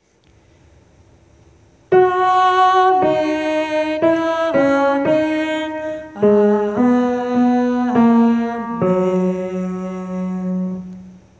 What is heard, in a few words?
amen alto